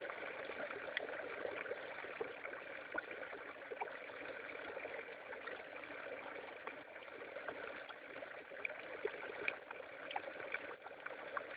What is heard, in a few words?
fountain